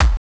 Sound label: Kick, Genesis; Terminator 1; M8